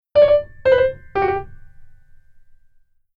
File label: Keyboard Mordent